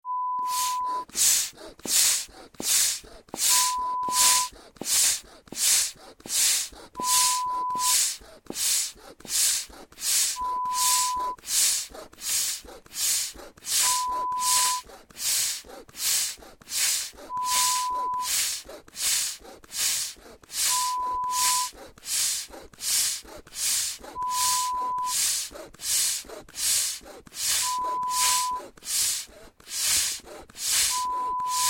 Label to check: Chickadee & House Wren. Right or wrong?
Wrong